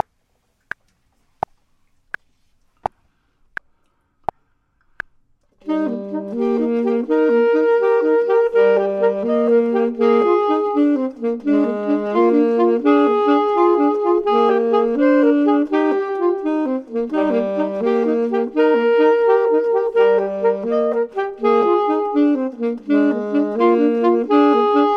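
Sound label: both, Saxophone and Clarinet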